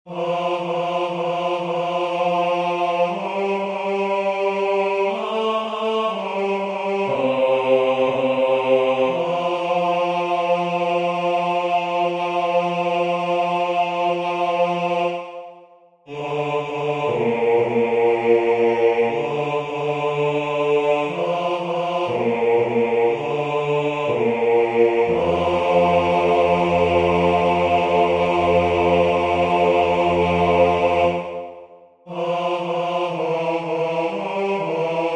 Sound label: Bass